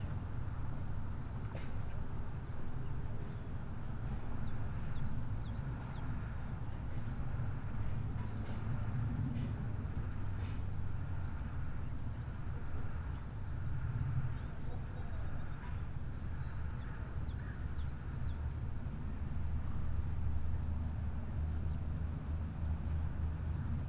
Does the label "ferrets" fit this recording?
No